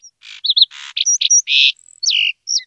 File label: rnd bird, ambient outdoor